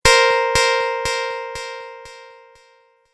Tap Error Delay, Tapping Sound Effects

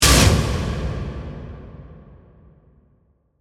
Hit Metal Reverse, Reverb, Dark, Short; Production Element, Imaging Element, Accent, Transition; BlastWave

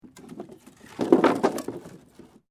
WOOD PLANK MOVEMENT; Material components for constructing more complex sounds; Materials

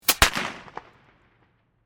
Fire, Firework, Bottle, Rocket; Backgrounds; Fireworks

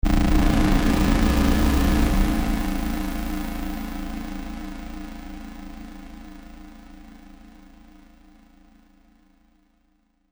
Probe Launch, Launching a space probe.; Spacecrafts

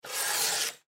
Paper Cutter Cut Paper; Office; Blastwave

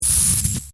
Electric Discharge, Magnetic, Science Fiction

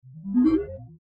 Logo Rising Tones; Sonic Logo; Blastwave

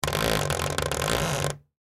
Wood Creak Squeak; Foley; Materials; Blastwave

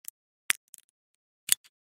Pill Packet Individuals Pop Pill Out; Plastic; Foley; Blastwave

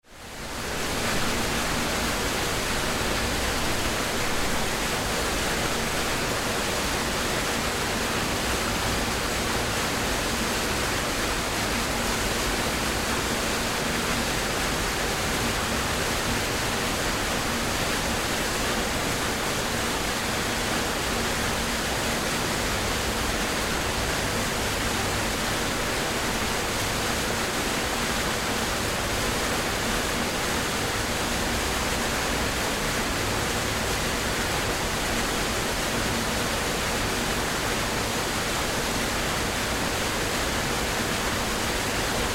Water Processing Treatment Factory Ambience; Factories; Industry

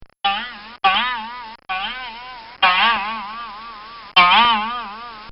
Cartoon Sound Effects Bongs

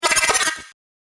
Paranoid Droid, robot; Robot Sound Effects; science fiction sound effect; Andrew Fleming

